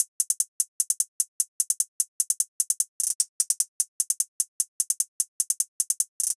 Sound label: Hihat Loop 150 BPM; Cymatics; Cobra